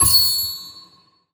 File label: gambler mouse click; DraculasCastleWD; wazdan; gambling